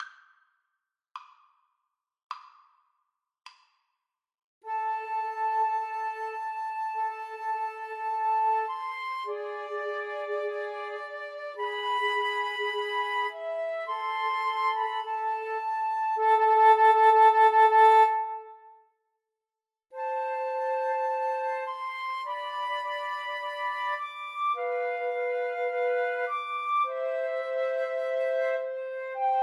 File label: Flute Trio